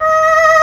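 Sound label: Chamberlain voice; AKAI S6000 CD-ROM - Volume 1; SYNTHESIZER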